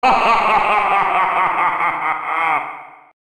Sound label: hahhahhah; Various Evil Sounds